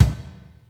BD, BASSDRUMS; DRUM SOUNDS

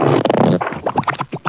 water machine; Widget Workshop; Maxis

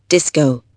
Disco

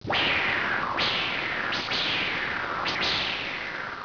whoosh; Image Bonanza; Expert Software; outdoors

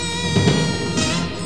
popup

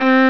Violin (C3); Return To Zork

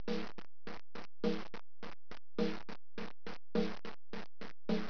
war drm; Image Bonanza; music

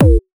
DRUMSHOT D+B 160, Drum+Bass, Singleshots; eJay

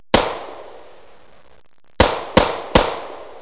pistol

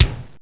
bassdrum, fx-perc; Octamed 6; walkabout; bassdrum 16

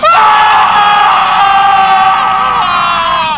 ahhhh; doom

